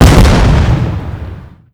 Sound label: 105mm boom; Explosions; Call of Duty Modern Warfare